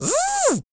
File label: DRILL; Spectrasonics Vocal Planet; Jazz FX